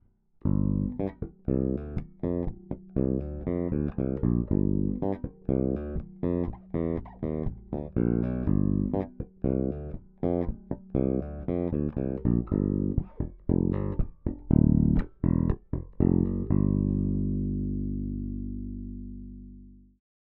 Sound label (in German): Ibanez passiv Bridge